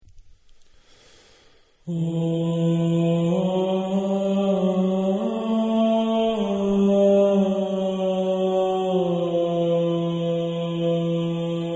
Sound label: Lead, barbershop tags